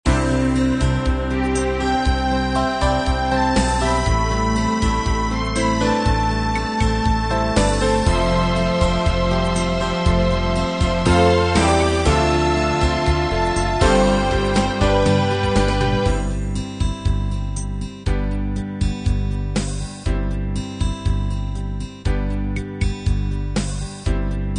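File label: unplugged 8 beat combined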